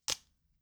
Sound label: three card flip